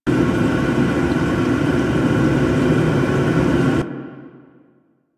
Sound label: Water Through Pipes Loop B; Warframe; Ambience; Grineer; Grn Mining Shaft